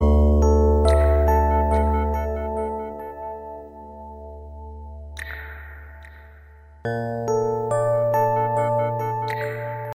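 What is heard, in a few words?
bass guitar sms ringtone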